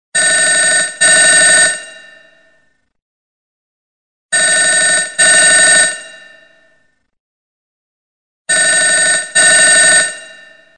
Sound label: classic bell ringtone